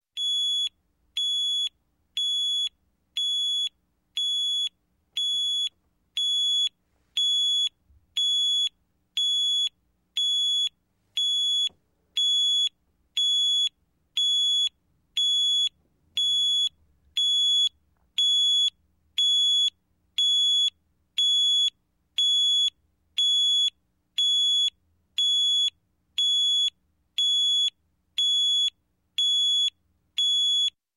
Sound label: Clock, Alarm Electronic Alarm Beeping, Radio; Sound Ideas 2000 Series